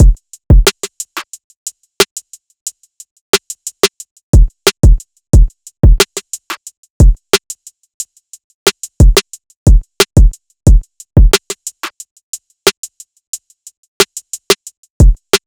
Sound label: Drum Loop - 90 BPM; Pillow Talk; Cymatics; Mystery Pack Vol 4